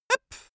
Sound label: cartoon male jump short